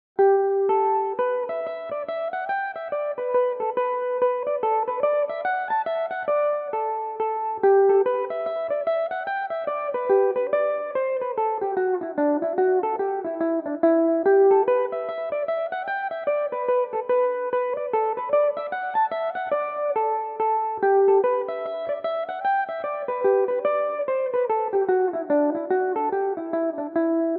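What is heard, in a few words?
E minor, Irish mandolin; jacky tar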